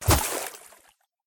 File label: water step; Golden Crayon Sounds